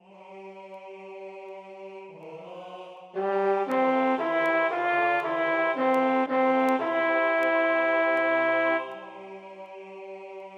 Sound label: tenor